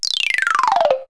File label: d bonk gls, cartoon accents; Kaboom! Junior